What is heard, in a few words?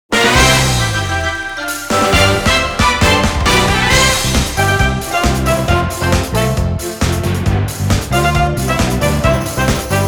Tropical Beat; musou stars original soundtrack cd 2017